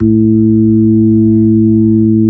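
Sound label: B-3 Club crossfade, Keys of the 60s and 70s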